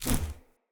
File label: Melee Whoosh; Enemy; Corrupted Sproutfolk; Foley